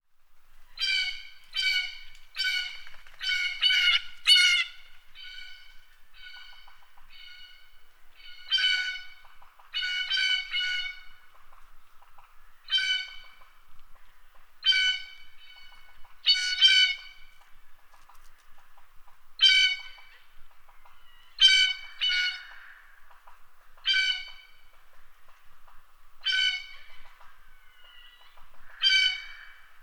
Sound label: blue jay cyanocitta cristata; xeno-canto